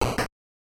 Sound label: door